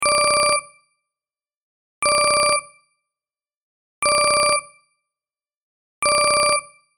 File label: ringtone